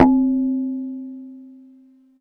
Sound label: Synth Bells; Dream Zone